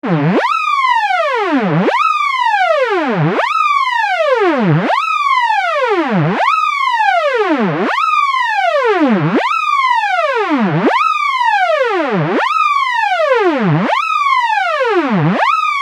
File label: police rock